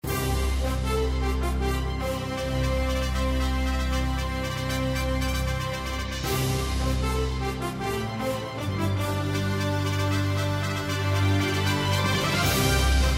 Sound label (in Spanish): open inicio brass musica sola; cineplex